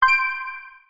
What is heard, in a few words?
sound warn; Wheel Race